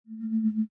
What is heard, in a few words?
gem Pulse; wild bazaar